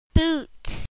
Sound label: boot